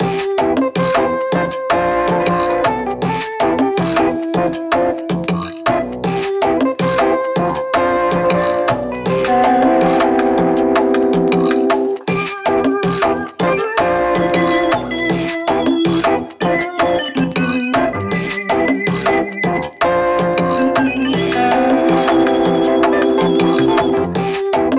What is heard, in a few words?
Music; u3d guided alien shop